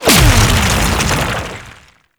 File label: Sentient Vessel Laser Explosion; Warframe; Tau; Abilities